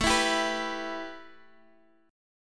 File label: E Minor A, Em/A chord; Em/A strummed; JGuitar